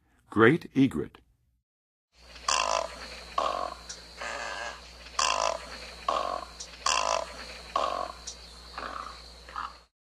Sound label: Great Egret, Birds of North America; Western USA; Western USA Songbirds